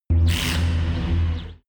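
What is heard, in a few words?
extrinsic chirp, energy; energie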